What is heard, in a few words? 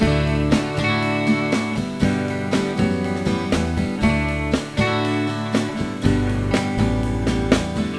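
Loop, synth fx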